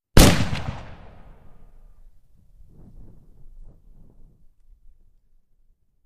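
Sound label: WW1 Mauser Anti Tank Rifle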